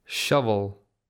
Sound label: shovel